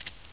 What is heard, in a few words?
elite slide release, weapons